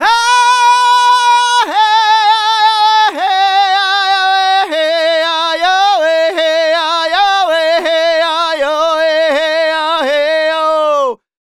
Native Vocals, Native American

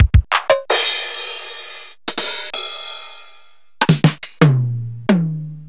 Acetone Rhythm Ace FR-7L

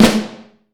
CAFL, Vintage Snare Drum; Q Up Arts; Johnny.C's Vintage Snare Drum; 5.5X14 SLR

